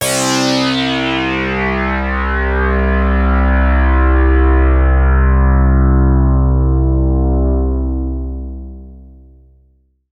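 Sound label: C3, synth, Progress DX Moog, CASIO FZ-1; ProSamples vol.55; Retro Sampler; Best Service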